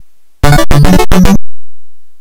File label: Reward